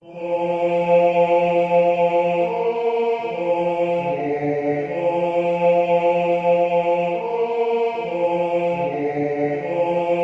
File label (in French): basse; Mesures 44-59 - Voix 2